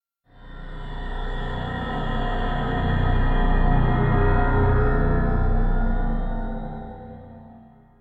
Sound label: Stinger Swell Long